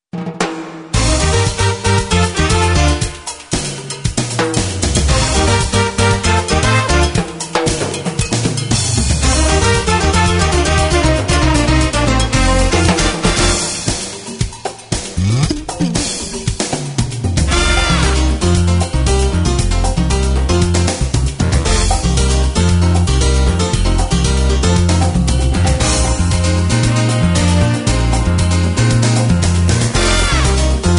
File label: Columbian Conga; Latin